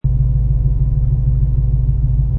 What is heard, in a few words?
Mustang 68 Fastback Engine Idle Loop On Board Perspective; Cars